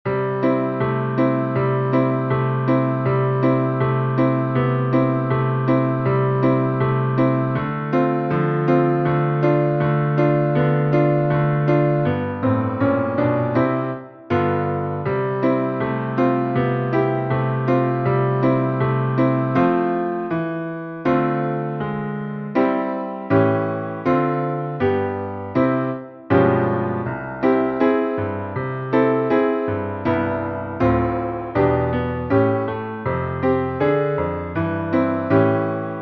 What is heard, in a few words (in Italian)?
violoncello Piano; natale; jingle bells